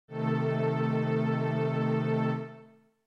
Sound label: A Diminished